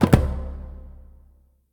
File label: quick stop; strollingstaxx